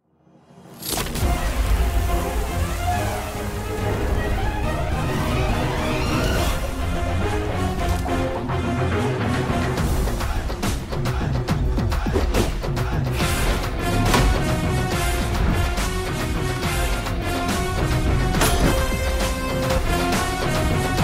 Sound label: teaser background music telugu movie bgm; bangarraju; kalyan krishna